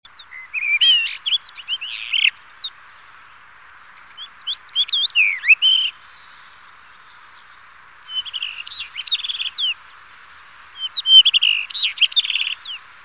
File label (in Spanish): roquero rojo